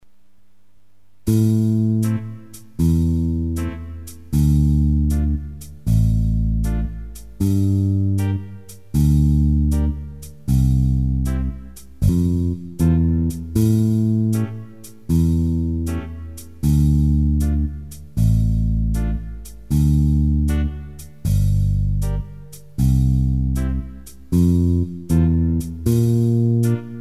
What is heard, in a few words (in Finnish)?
Slowfox vaihtobasso; hidas suffle eli hidas swing; Kolmimuunteista; Basso soittaa kahteen tai neljään; Tempoalue 80-120 bpm